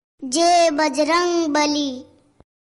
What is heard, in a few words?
jai bajrang bali message ringtone